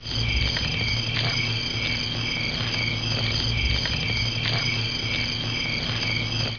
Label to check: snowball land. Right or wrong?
Wrong